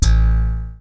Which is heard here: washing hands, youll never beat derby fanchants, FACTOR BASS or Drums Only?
FACTOR BASS